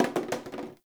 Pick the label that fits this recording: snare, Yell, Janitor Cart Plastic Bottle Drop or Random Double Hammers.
Janitor Cart Plastic Bottle Drop